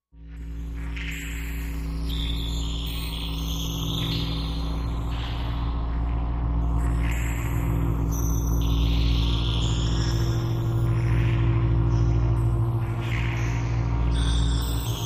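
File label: Humming Tunnel, electrical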